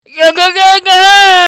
googoo gagaaa; human sounds

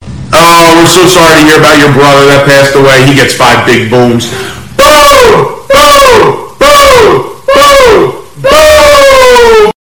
Five BIG BOOMS

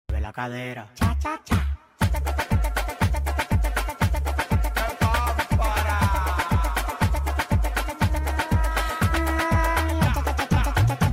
CHA CHA CHA Meme Sound Effect

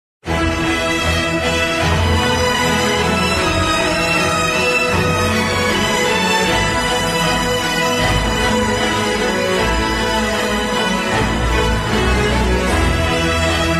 samsung ringtone slowed reverb; Ronnie mcnutt

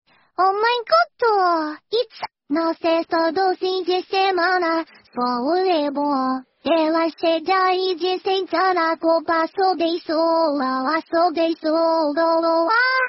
Slowed

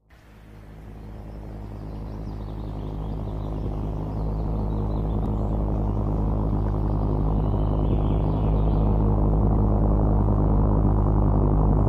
Crash Scenario; V1

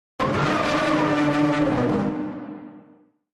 prowler noise; made with Voicemod

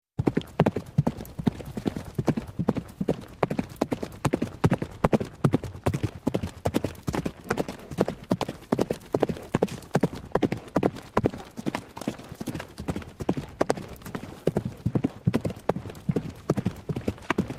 horse gallop sound effect; made with Voicemod

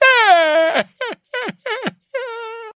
goofy ahh crying meme sound effect

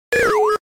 Fatal error ERROR

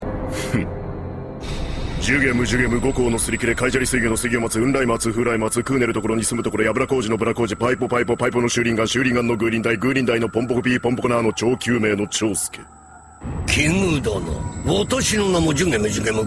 heheheha. grr.

